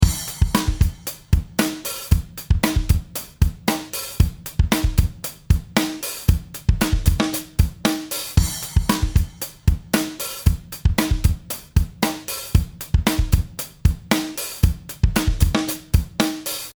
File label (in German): Drums bearb